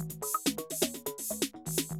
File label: 125, Classic Drum Loops